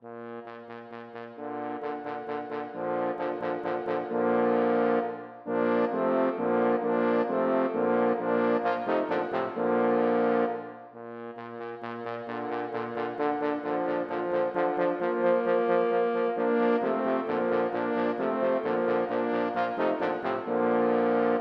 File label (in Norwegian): For fire tromboner baritone